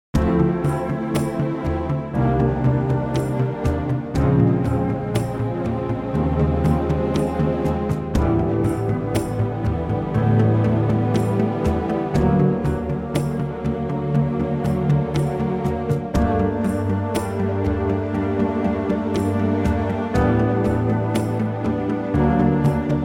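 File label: orchestra G minor; key - Gm (Bb) - vocal range - A to Bb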